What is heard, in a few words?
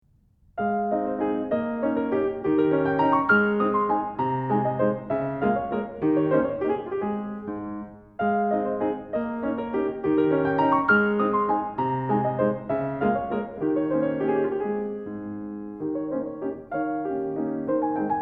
Valse In A-Flat Major; Magrath Book 07